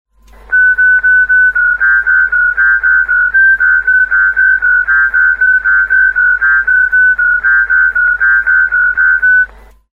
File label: Throb-4, digimodes